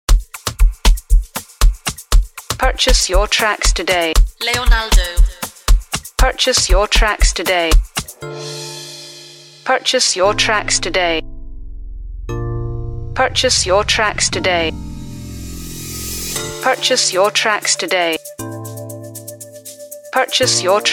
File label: amapiano